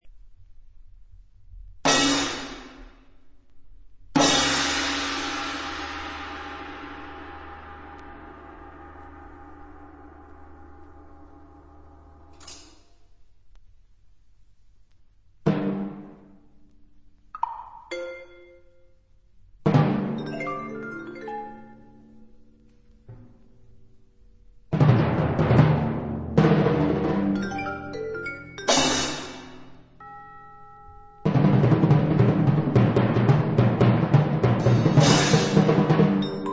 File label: Double Music